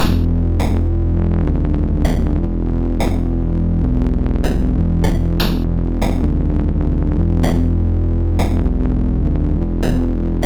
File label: sid MOS6581R4, GAMES; Aussie Games